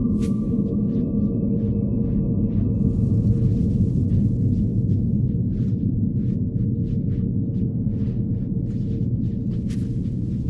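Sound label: ambient particle storm; Stellaris; cosmic storms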